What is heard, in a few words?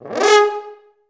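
french horn G#3; Best Service; Peter Siedlaczek's Advanced Orchestra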